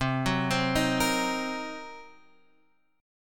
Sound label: C Minor 7th Flat 5th Guitar Half Step Down, chord